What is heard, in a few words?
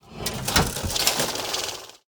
Cash Register Ticket Underlay Attack